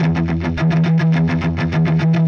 Chunk Guitar

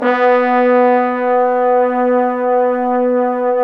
BRS FF BON, Bone Sec.1; Roland LCDP06 Brass Sections

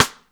SN side, Drum Machines; 300 Drum Machines; E-MU Orbit-3

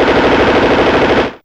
Rifle Auto, Fairlight CMI IIe Machines

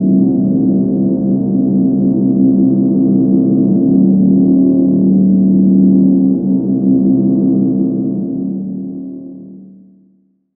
drone c, Alien & Drones (atmo); ambient; Chillout

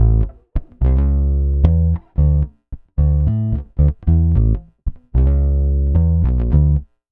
Pop, Bass

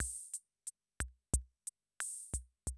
CR78, DrumLoops

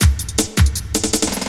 LOOP, Drum & Bass, 160 BPM

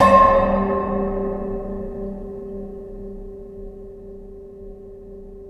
space bass D; InVision Interactive; Lightware VOL-1; Instruments & Percussions; DEMBY

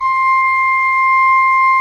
RFRP C5, Cathedral Organ, ROHRFLOETE; Propeller Island

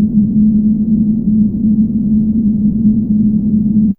Whistle C2, Fairlight CMI IIe Analog

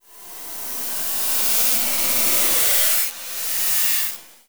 FX Scare, Drums & Drones

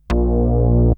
Synth hits and riffs

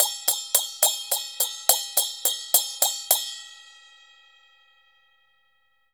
CHNA SM17 R, Heart of Asia; Spectrasonics - Heart of Asia CD2

